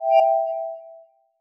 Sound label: radio menu